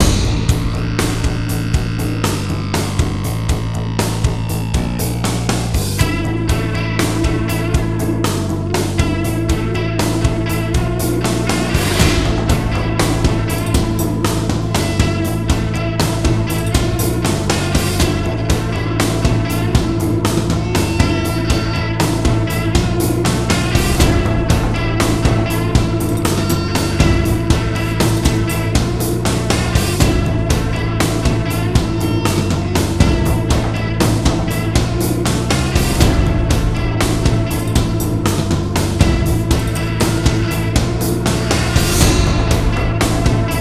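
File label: ACTIVATE TRYP RHYTHM SECTION, soundtrack; transformers war for cybertron gamerip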